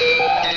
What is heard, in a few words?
DIAL